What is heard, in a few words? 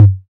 dry tom drum g key; tom drum samples